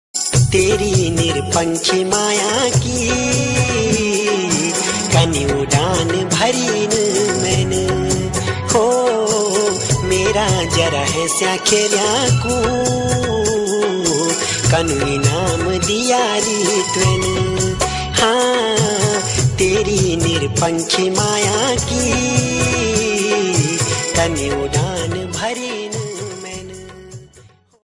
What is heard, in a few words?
uttrakhandi ringtone